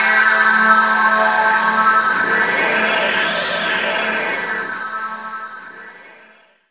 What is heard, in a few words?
DR. LOOP, TRANCE; X-Static Goldmine 3